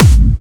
Clubby Kicks, Bassdrums

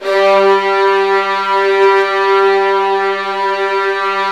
G1, Virtuoso Strings

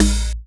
percussion one shot mixed punch offbeat; Sample Magic; Classic Chicago House; Drum Hits

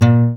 GUI AC, GUI Acoust. Slap; Sound & Vision; Gigapack I CD 2; GUI ACOUSTIC 2

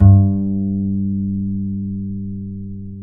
G 3, Bass; Spectrasonics; Bass Legends

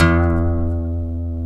F#, funk guitars

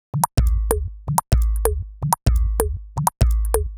Top D 127bpm, House Drumloops; Zero-G House Fabrik Drumloops; ZG Drumloops

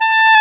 WND CLAR F, Clarinet; Roland L-CDX-03 Disk 1; Clarinets

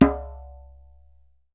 DJEMBE

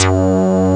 hard synth, Amiga Soundtracker; ST-XX Amiga Soundtracker Sample Packs

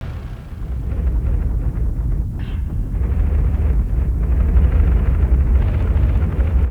Kaos FX, Ambient Grooves

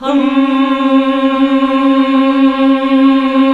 Nuns Hmmhh; Sound & Vision - Gigapack II

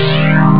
string; puznic; Amiga Soundtracker Sample Packs

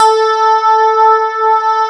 CLAV, FUNK FM CLAV

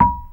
GAMBANG A#5; Spectrasonics; Heart of Asia

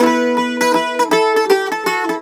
Celtic Flavours; USB Soundscan vol.42; AKAI

